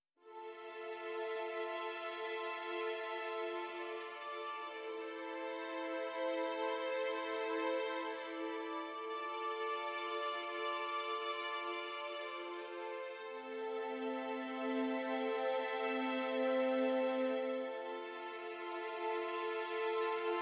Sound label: Strings